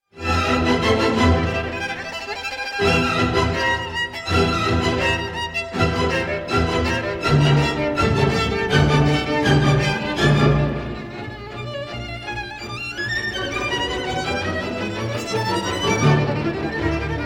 violin concerto in D op 35; boston symphony orchestra; tchaikovsky; charles munch

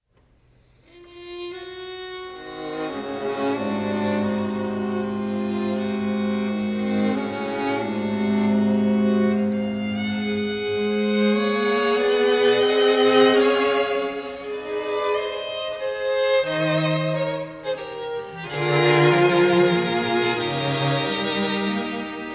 quartet in e flat major; Wolfgang Amadeus Mozart

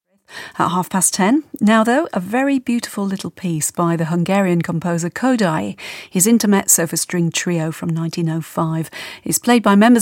intermezzo for string trio; dante quartet

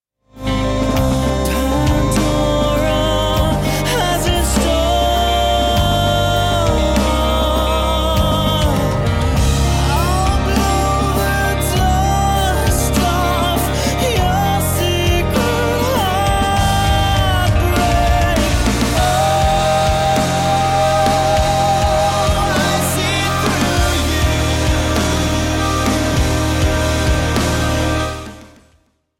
piano disappearing ink

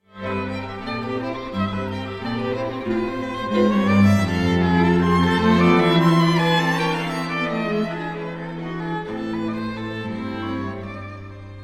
polka, string quartet; vertavo string quartet

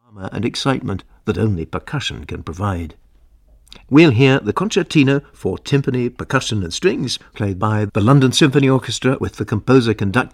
concertino for timpani percussion and strings; andrzej panufnik

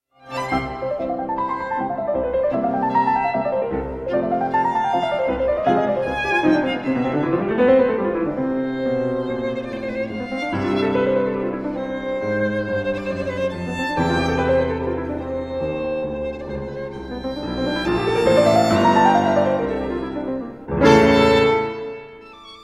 sonata for violin and piano; Ludwig van Beethoven; op 24 in F major

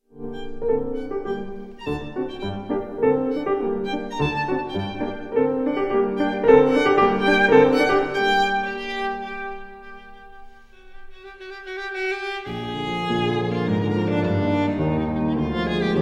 sonata for piano and violin; ludwig van beethoven; no 8 in g op 30

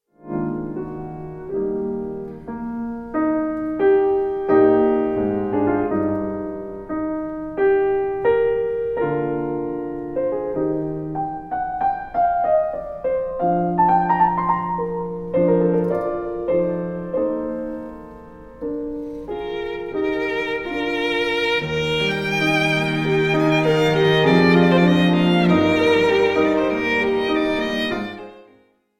piano trio in b minor, Mozart; wolfgang amadeus mozart

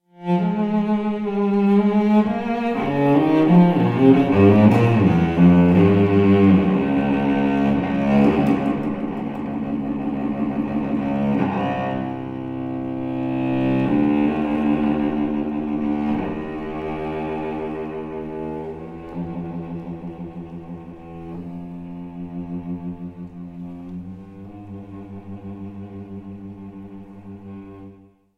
string quartet no 1 in e minor; bedrich smetana; skampa quartet; from my life

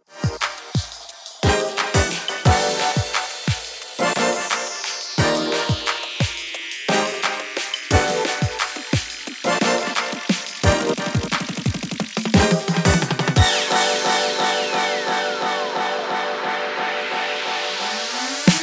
drums

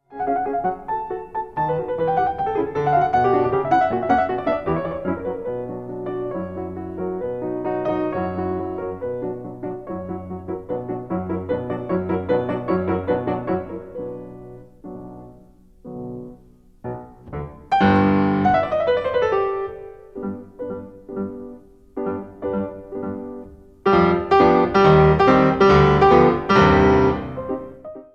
sonata in G major for piano; op 31 1

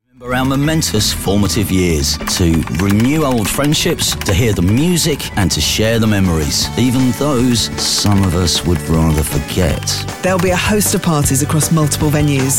moog ensemble, english country dancing; will gregory

